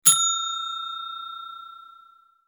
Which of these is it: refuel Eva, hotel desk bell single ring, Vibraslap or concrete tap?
hotel desk bell single ring